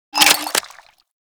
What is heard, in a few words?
Post Shot; Warframe; Scaldra AK